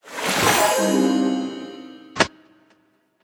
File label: gambling win; Hungry Shark; Wazdan